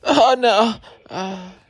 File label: male scream